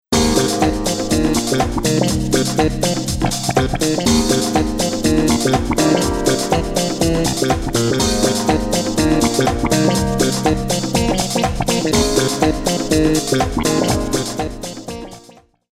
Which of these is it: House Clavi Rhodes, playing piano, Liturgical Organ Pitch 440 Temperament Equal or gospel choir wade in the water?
House Clavi Rhodes